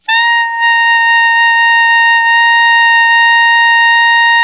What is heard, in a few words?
ALTO PP B 4, Sax